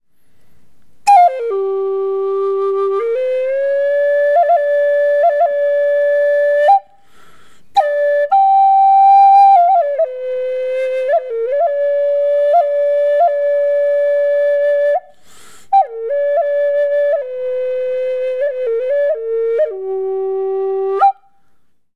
3 maderas seco

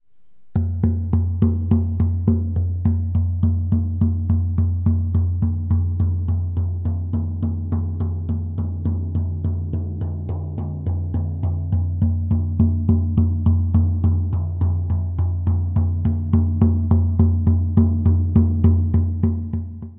Tambor baño sonido reverb

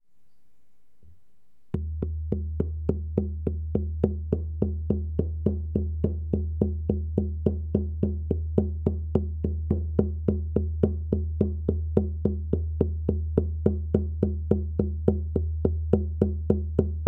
Tambor Temazcal seco

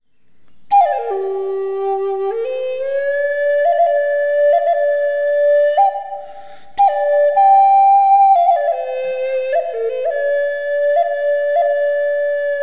Libelula reverb, flauta

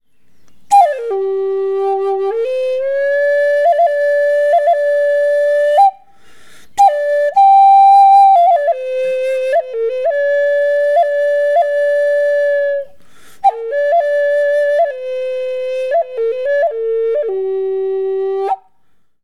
Libelula seco, flauta

